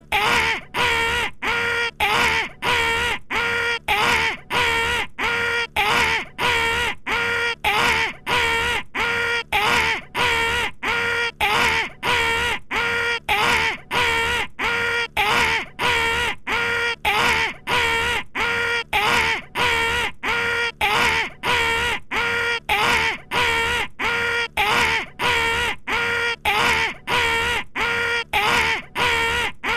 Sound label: alarm